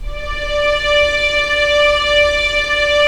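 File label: STR SC, string section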